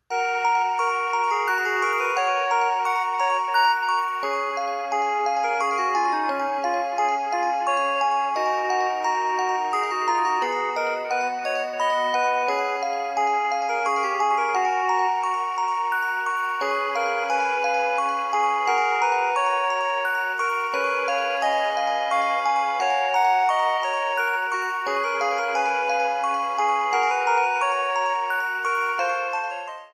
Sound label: ringtone